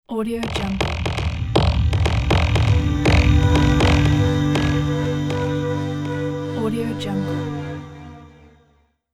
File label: modern elements logo